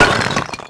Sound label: star break; Tony Hawk's Project 8; XBOX